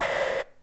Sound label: connect; outfly